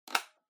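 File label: Portable Cassette Rewind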